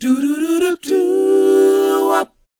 DOWOP A; ILIO; Vocal Planet

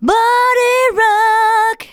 VOCALS SUNG; Techno Trance Essentials

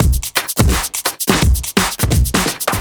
Trip Swing Mix, 85bpm; off the grid

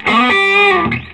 SOLO G, Vintage Blues Guitar

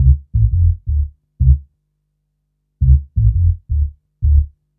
Big Bass, Drum & Bass; Fluid Dynamics

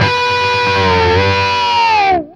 DRAG G 8, Guitar; East West; Steve Stevens Guitar Samples Collection

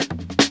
Roll, Drum Rolls; Jungle; Zero-G Jungle Warfare 1, 2 & 3; 90 s Sample CDs

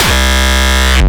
Speedcore Kick

